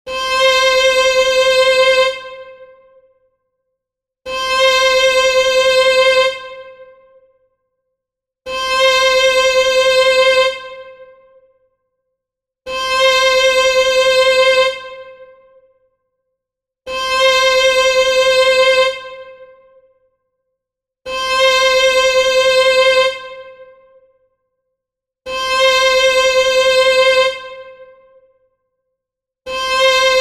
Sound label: Fifth Position Exercise 60bpm, mandolin